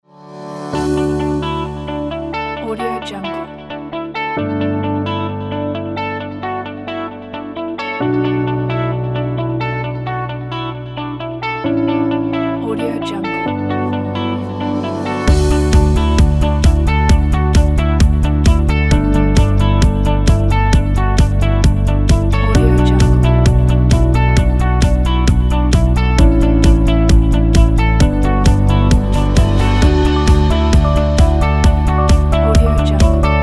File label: corporate inspiring and uplifting